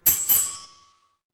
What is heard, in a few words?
door doorbell